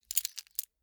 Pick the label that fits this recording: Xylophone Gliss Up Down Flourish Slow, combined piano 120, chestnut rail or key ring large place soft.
key ring large place soft